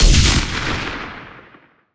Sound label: Sci-Fi Weapons-Sniper Rifle Single Shot